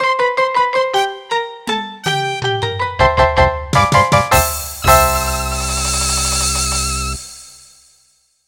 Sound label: Win